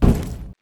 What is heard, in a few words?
footsteps, duct; player